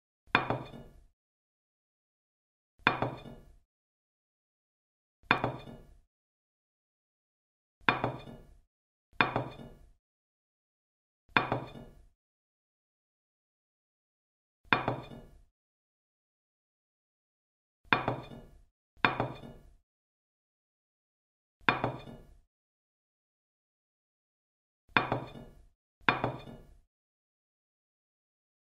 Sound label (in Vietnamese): tieng dat sap xep chen dia ly coc do vat len ban